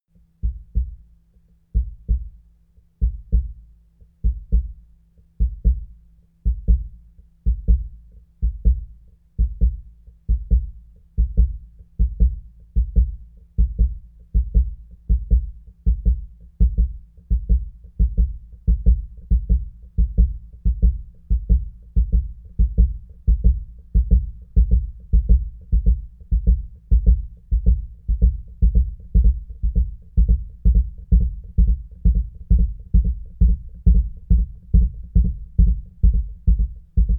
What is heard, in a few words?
heart beat full sequence